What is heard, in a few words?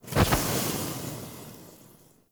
Torch Puzzle Water Extinguish; Puzzles